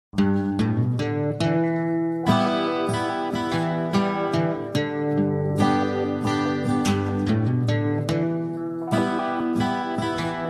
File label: soft guitar ringtone